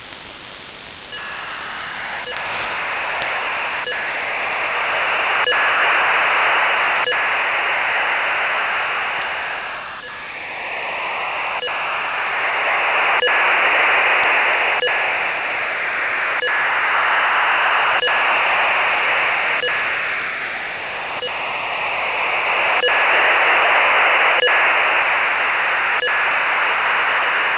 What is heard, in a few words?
5421khz qam-16 2400bd